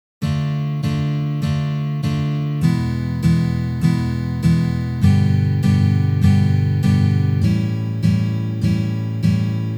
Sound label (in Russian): Uprazhnenie dlya igry akkorda Re minor na gitare